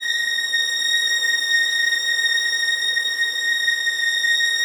Violins, A#2, Classic Orchestra; Best Service ProSamples vol.51

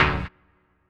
HOUSE, House Dance

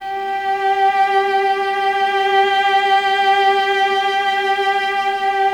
VLS G 4, Violas; Miroslav Vitous; VLS 1+2

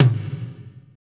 tom, Power Ballad; Northstar; Drumscapes Roland

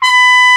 Trump mf B4; Digital Sound Factory Virtuoso

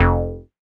bass F1, ARP Odyssey

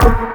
BLOCK; USB Soundscan vol.33; AKAI; Disjointed Textures; BLASTER

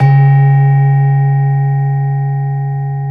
SLENTEM, Bronze Gamelan; E-MU Formula 4000 Series Vol. 4; Earth Tones

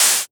hat, EMS VCS3 Drums; Polyend; Simulacrum Sounds; 1 Shots; Synths

